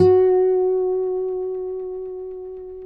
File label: Finger F#4 R, Guitars; Spectrasonics; Hans Zimmer Guitars VOL-1